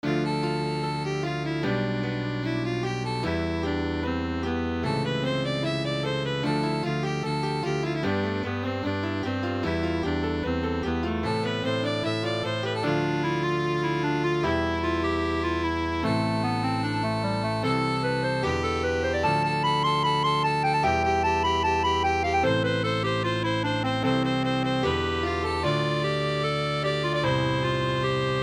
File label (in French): Melodie d intro